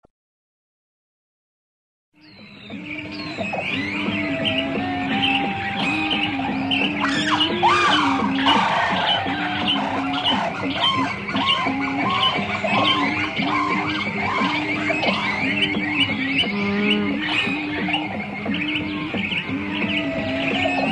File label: Background Music and Animal Sounds